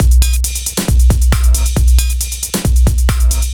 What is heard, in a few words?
Bpm136 Break, Dubstep, Drum Loops; Barbie Ghost